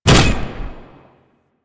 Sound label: Morph Puncture; Warframe; Warframes; Khora